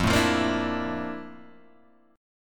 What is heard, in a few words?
F Major 13th, chord; strummed